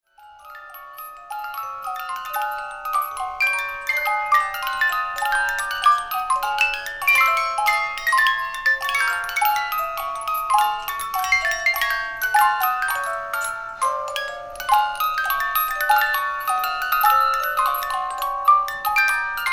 three snow globes

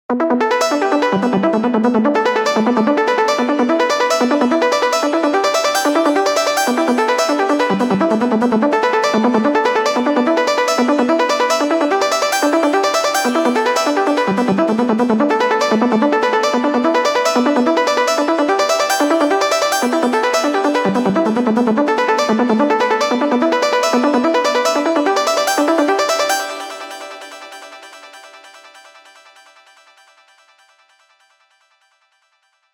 solar arpeggio

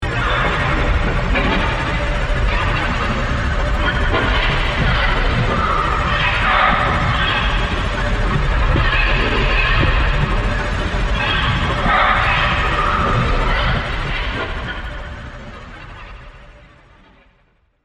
processed noise

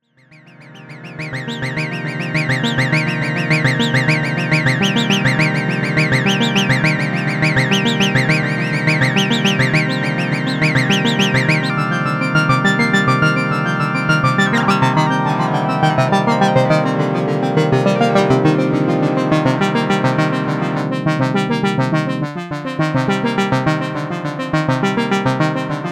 OB-8; Standalone; Demo

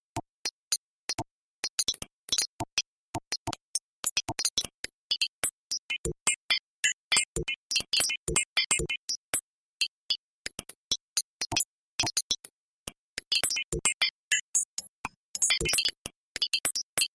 meditation bell simpler freakout

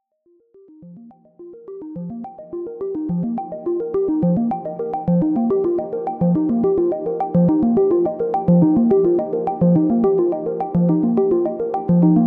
Detuned Square Wave Arpeggio

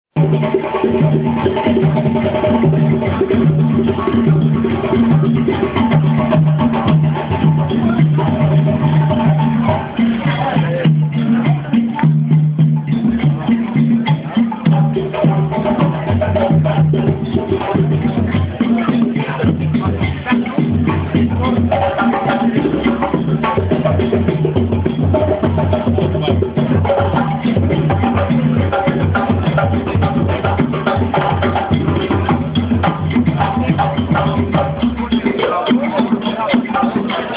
phone recording of drum jam in mexico

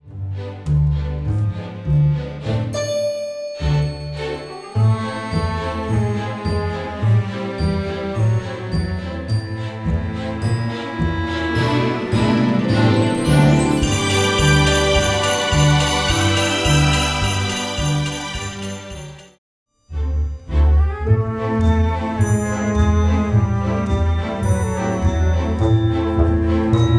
Synth vs Live orchestra; Tom Player

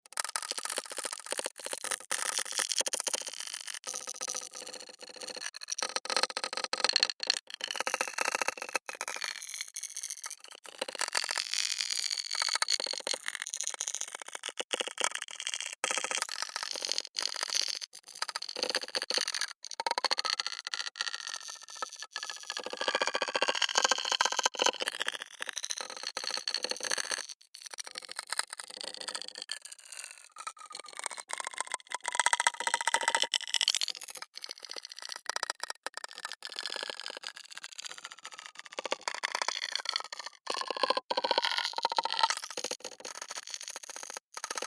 humming bird morse code

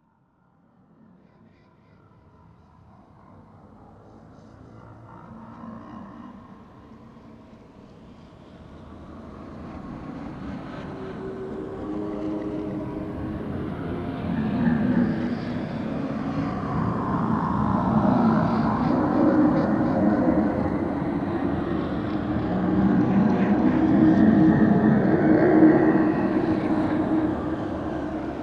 quick and dirty ambiance from hell

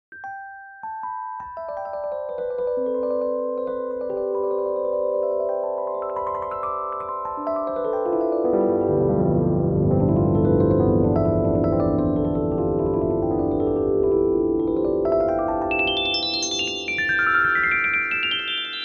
major scale

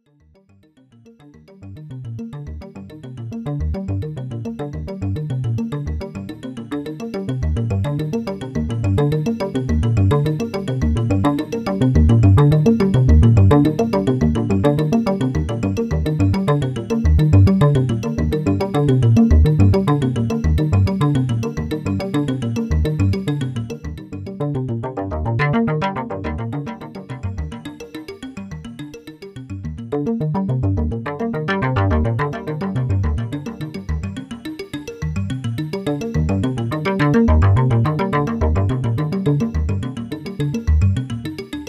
Stacked Polys

